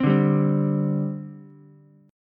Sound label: G Suspended 4th, Ukulele; strummed; JGuitar